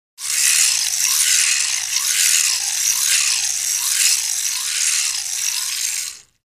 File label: Electric Motor Strain, Electric Motor; Large